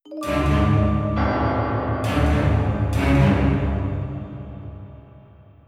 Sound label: Sting